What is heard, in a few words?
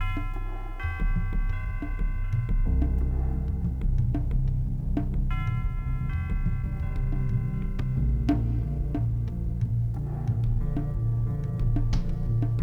music cave; Ty The Tasmanian Tiger 2 Bush Rescue; XBOX